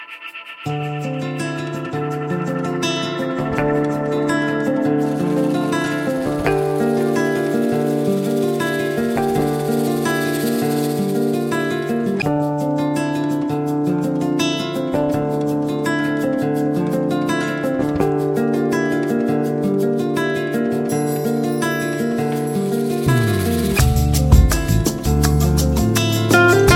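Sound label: ringtone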